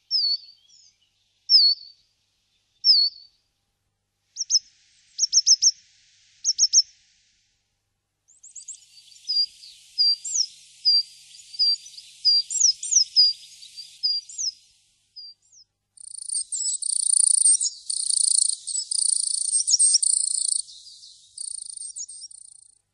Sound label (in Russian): moskovka pozivka; ornitologiya